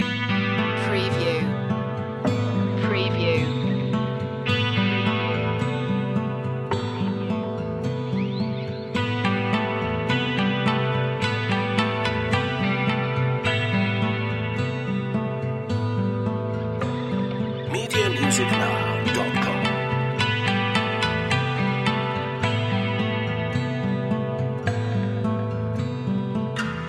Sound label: japanese garden loop